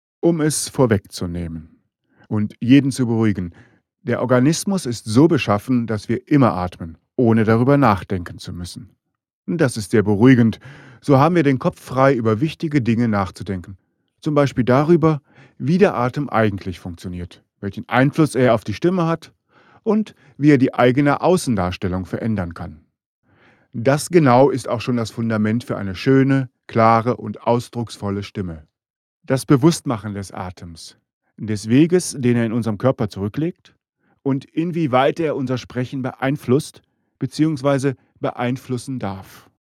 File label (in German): atem